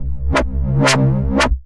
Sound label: Fuzz Step, Bass; Ableton; Skitter and Step